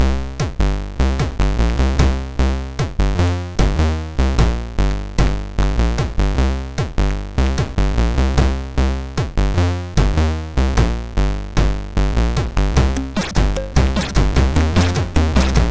Beatmix, MOS6581R4, sid; Stember Rudolf

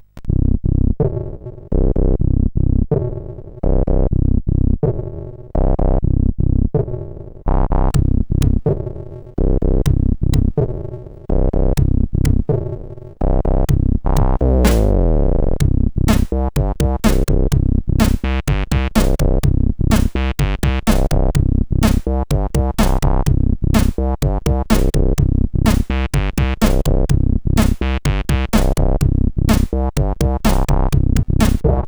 Rave, CSG8580R5

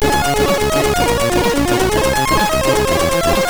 sid MOS6581R3

